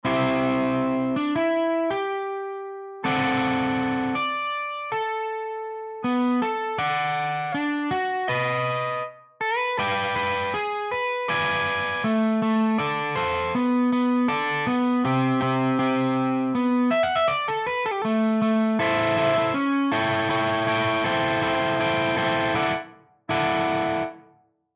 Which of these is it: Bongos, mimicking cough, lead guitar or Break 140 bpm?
lead guitar